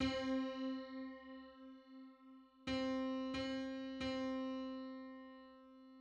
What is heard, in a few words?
Two-hundred-fifty-third subharmonic on C